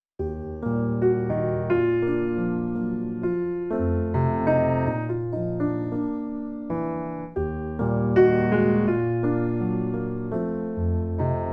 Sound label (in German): Instrumental